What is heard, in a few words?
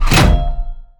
turret lost player; Counter Strike Model Pack; CS:GO; survival